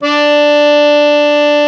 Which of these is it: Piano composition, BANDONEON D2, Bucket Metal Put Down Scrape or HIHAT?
BANDONEON D2